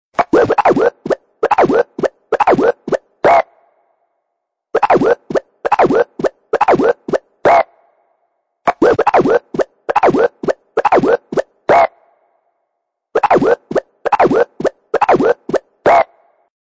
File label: Nokia Scratch